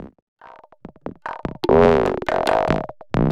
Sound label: TABLAS; Zero-G; Chemical Beats